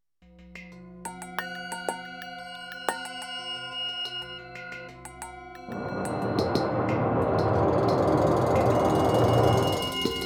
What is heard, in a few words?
Bowls